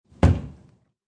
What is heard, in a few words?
Single bang as a cabinet door shuts.; Door Closing (Sound Effects:Doors)